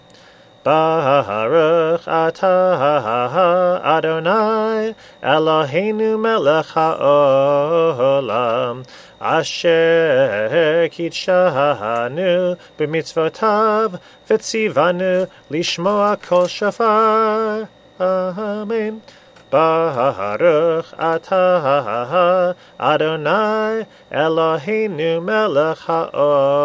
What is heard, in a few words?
shofar blessings